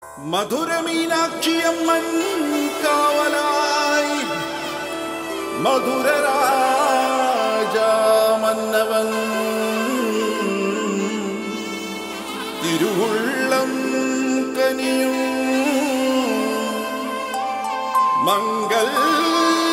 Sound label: Devotional Ringtone